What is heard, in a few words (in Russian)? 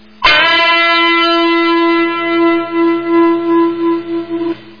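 гитара аккорд с подтяжкой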